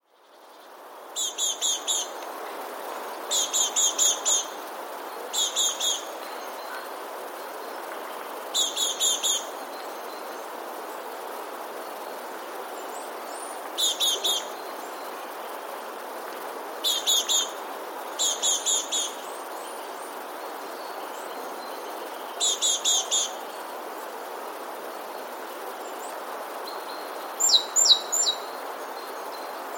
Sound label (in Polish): spiew; dziwnie śpiewającą modraszkę; modraszka